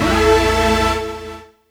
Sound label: technosyn2, Techno Trance; Ueberschall; SYNTH